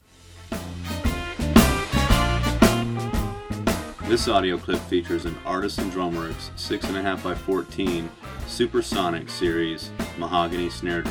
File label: mahogany jingle, drum